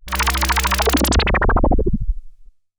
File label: Juno Bubble